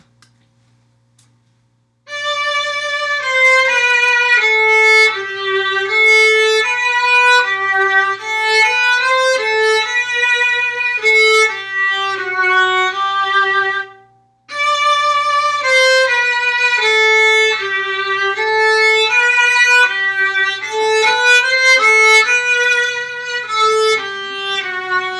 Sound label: Deck the Halls violin part